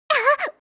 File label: aah; hidan